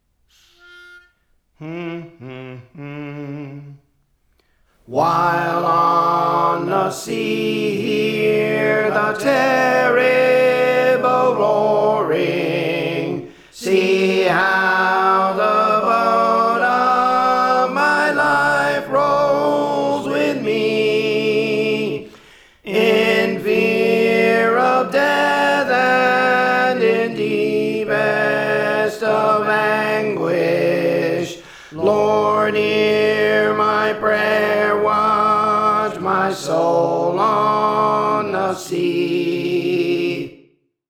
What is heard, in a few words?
Tenor; Praise For The Lord Training